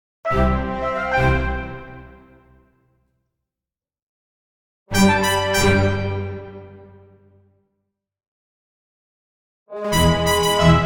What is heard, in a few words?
Orchestral Jingle Positive